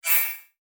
Multimedia Internet CD-Rom Flash Short

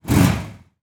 Auto Motorcycle Rev Short; Transportation

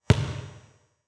Sports Basketball Bounce With Lights On Single

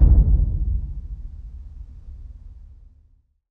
HITS, Cinematic Sound Effects

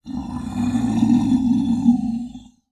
Animal Mammal Carnivore Tiger Snarl; animals; AdobeCC

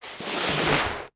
Snow Ski Sliding Over Snow; Sports

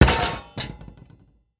Impact Metal Object Dropped Wood Floor; Impacts

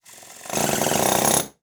Industry Tool Run Air Chisel

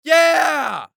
Human Male Cheering Yeah

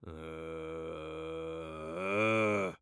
Human Male Growl Cave Man Growl

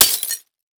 Impact Glass Window Pane Break On Cement; Impacts

